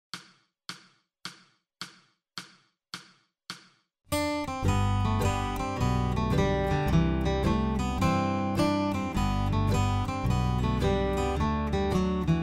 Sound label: Guitar Variation Slow